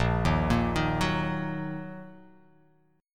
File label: Bb Suspended 4th Sharp 5th Bb down, chord; arpeggiated